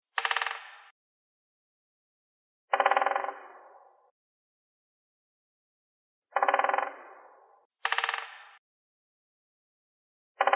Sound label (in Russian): dyatel drob